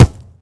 Player Shoot

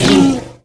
hit, creatures; Star Wars Obi-Wan; XBOX

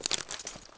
glass step; player; steps; Soldier Of Fortune II Double Helix; XBOX

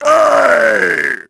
death; HADEAN; Pulse Racer

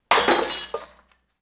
break dish

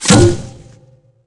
Grenade Fire, Machine Gun

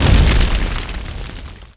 flaming fireball; Tony Hawk's Project 8; XBOX

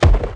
Ice Beast Thud and Slump, Aliens

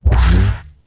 saber death

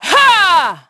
EFFORT; Pulse Racer

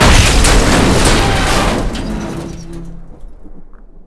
truck

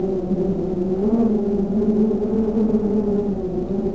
Wind Rear

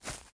fs grass, footsteps

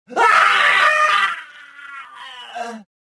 death, rodian

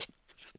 muffled stop, footsteps

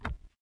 footsteps Metal

